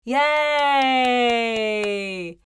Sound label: Mature female applause and cheers